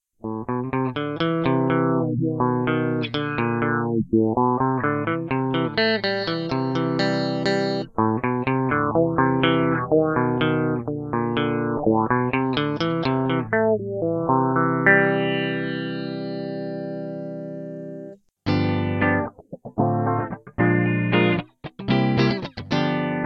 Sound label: Guitar - Filter